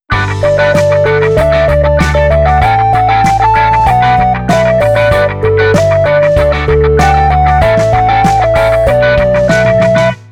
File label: Cutting